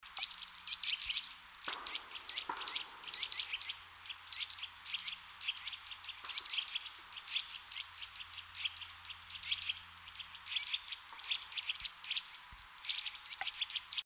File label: Sitta europaea; SETE